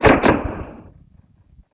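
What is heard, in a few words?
Starship Force Field Effect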